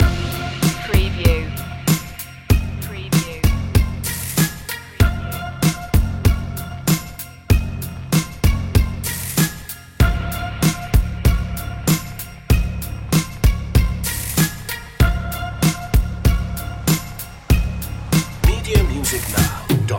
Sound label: stoned rap loop